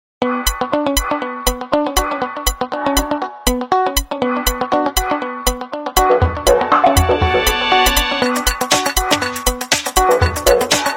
originalnye ringtony nokia 5310 xpressmusic

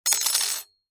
zvuki viazaniia

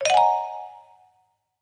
zvuki dlia viktoriny

